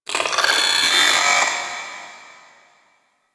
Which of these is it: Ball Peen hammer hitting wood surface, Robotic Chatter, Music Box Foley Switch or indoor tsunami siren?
Robotic Chatter